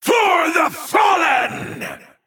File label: Male Naga Attack